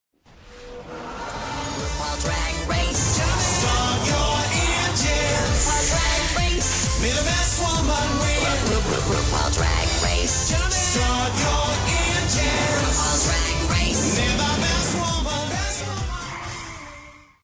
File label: theme song; rupaul's drag race